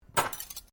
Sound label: Cutlery general pick up, Household cutlery